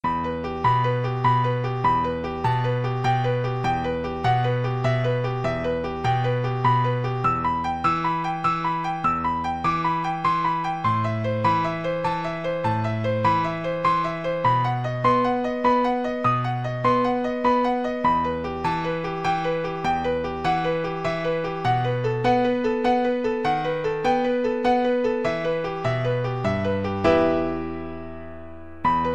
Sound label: spanish romance, piano